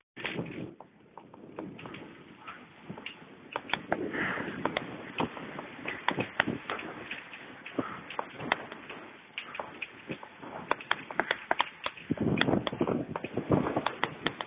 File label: foley